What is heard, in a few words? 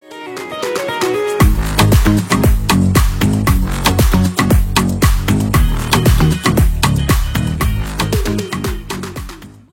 Song Instrumental Ringtone